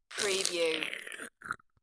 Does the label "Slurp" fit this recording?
Yes